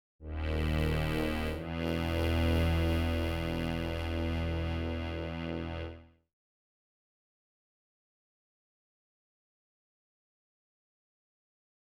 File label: Synth; Mr. Tabs